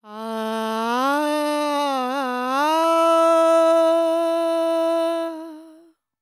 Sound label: World Voices, INDIA; ILIO; Vocal Planet